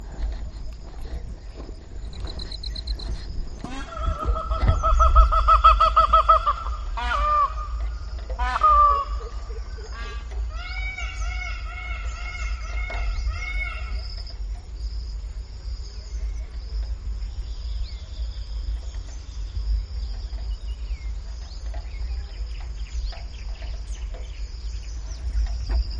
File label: pavo cristatus sound, Павич звичайний